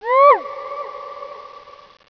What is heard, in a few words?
GOOP; Monster Media Number 15